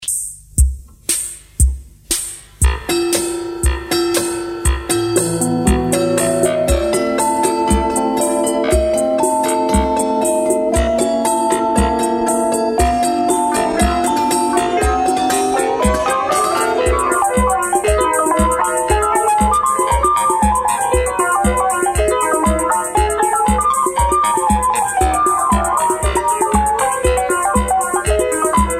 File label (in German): Raum Orgel; Karl-Ernst Sasse; DDR